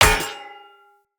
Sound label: Polearm Medium Impact Block Defender; Weapon Impacts; Combat